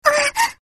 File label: ahh